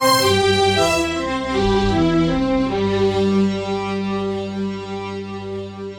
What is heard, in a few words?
strings